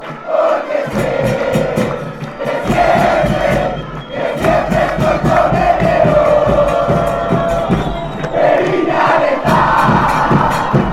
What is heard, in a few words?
chant; santa fe